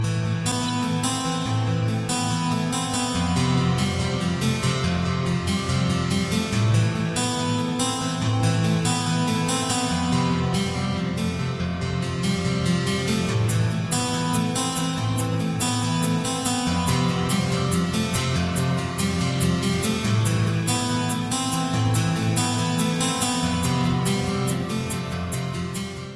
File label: special piano